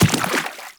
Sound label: step water, footsteps; Warframe; Teshin